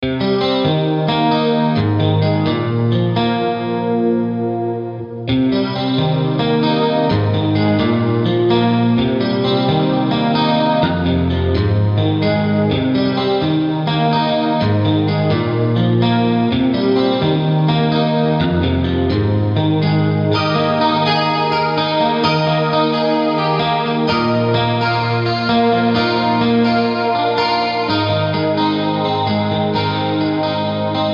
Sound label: Eventide H3000 Shimmerish Guitar